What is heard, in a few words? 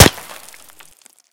default, bullet collide; STALKER; IWP